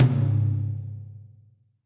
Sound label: Tom Mid Dark Plate Verb; drum hits; Ableton; Factory Packs; Session Drums Club; Standard Hits